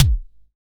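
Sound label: SDS V BD, bass drum; Best Service; Real Mega Drums VOL-1; SDS V KIT 2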